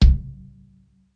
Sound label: bass drum